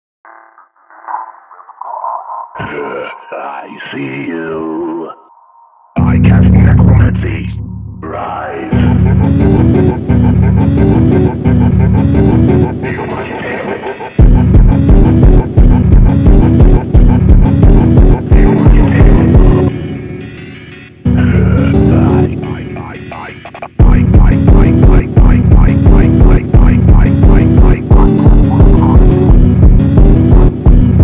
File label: Funk, speedup